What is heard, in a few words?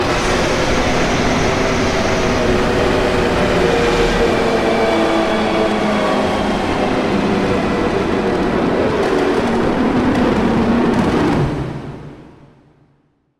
jumpscare sound; fazbear shift 5